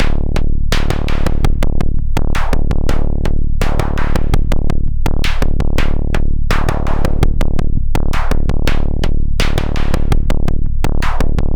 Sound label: Synthetix, Processed Percussion